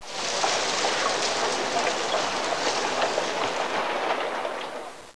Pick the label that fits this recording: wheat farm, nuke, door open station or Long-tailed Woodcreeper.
wheat farm